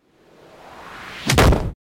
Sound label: outro muerte sfx; without music